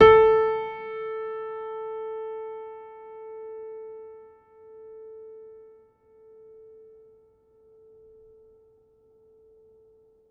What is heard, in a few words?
Upright Piano; HSS OP Pack; healing soundscapes